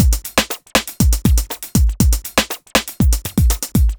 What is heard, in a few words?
120 beat full, Nu Jazz House; PowerFX